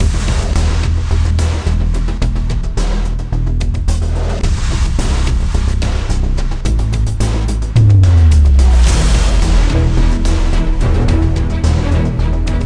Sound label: Environment Music